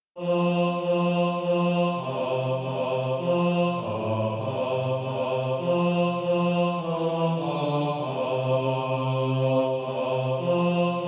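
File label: bass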